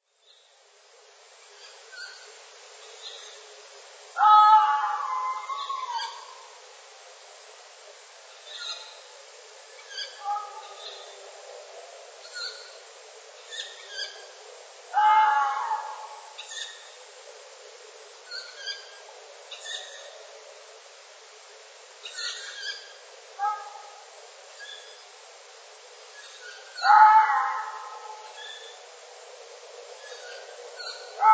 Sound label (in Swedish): kattuggla